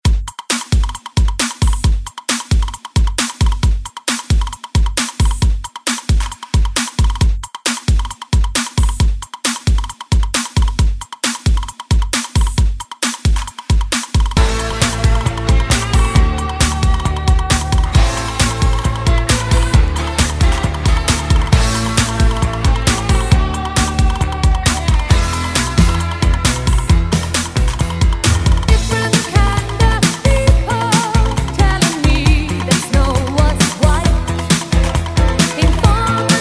80s Re Drum 134Bpm

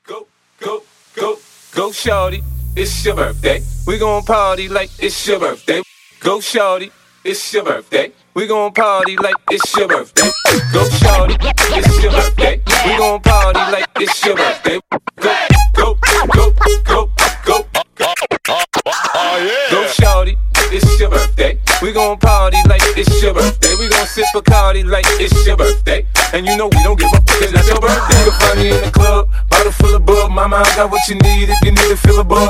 Acap Scratch Break 107 BPM

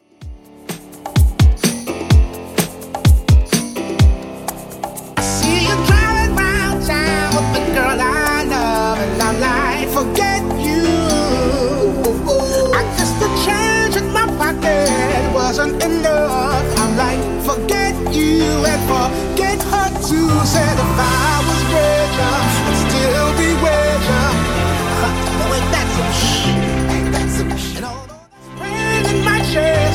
2ks Redrum 127bpm

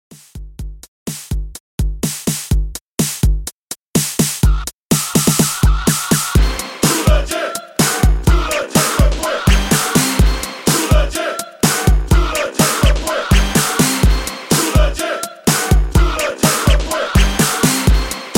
90s Redrum 125Bpm

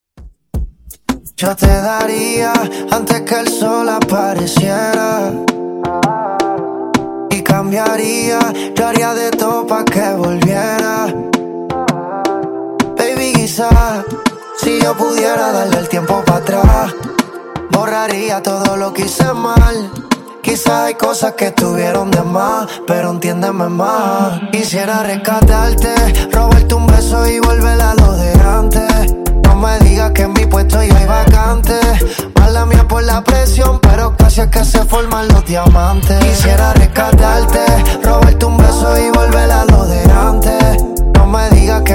Dirty 82Bpm

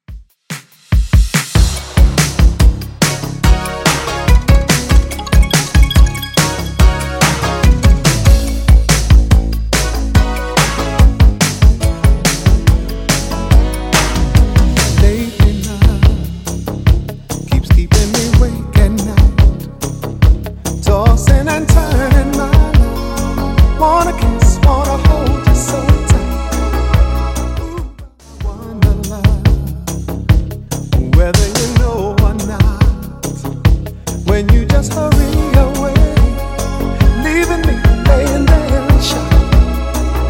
80s Redrum 143bpm

